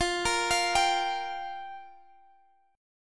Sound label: G Minor 7th F